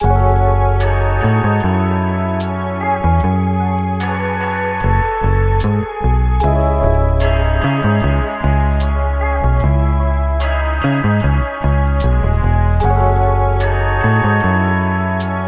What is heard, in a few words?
150 BPM C# Min, Hip Hop Melodies Classic; Code Of Honor; Cymatics